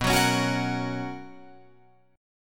B Major 7th Suspended 2nd Guitar Open F, chord; BM7sus2; strummed; JGuitar

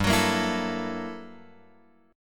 G Minor Major 13th, G; {3 X 4 3 5 5} strummed

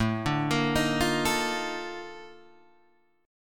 Bb Major 11th A

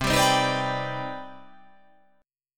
C Minor Major 9th C

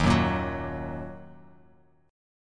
D sharp Minor, chord; strummed; JGuitar

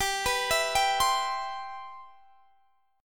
G 6th

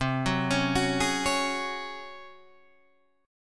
Db Major 7th Suspended 4th C

